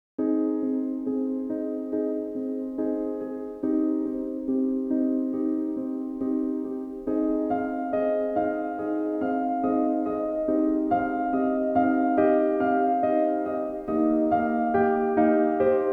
Classical Music Orchestra, Calm Music for Studying, Classical Piano Music